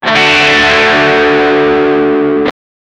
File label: crazy guitar power chord